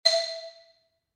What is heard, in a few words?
tone